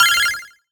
Retro FX; Cymatics; Video Game Sample Pack Vol 1

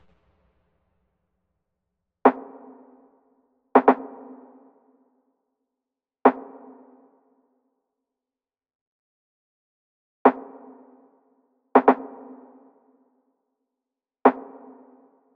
Drum Loop, 120 BPM Rim; Loop Stems; Cymatics; Vibes II Drum Loop Collection; Operation

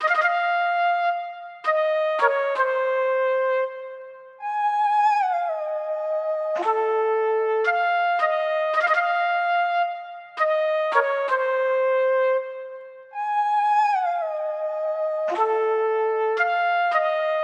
Nostalgic Melody Loop 110 BPM A# Min Flute; Loop Stems; Cymatics; 2020 Melody Collection

